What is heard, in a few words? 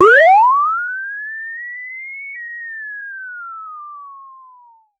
cartoon stun sound loud